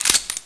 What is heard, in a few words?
usp slide release, weapons; csof